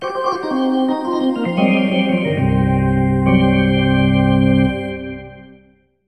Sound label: Hockey Organ